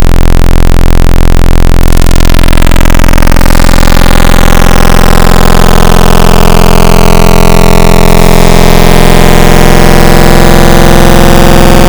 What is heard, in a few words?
Square Phaser; FM Wavetables; Synthetic's Serum Collection; Cymatics - FM Wavetables Vol 4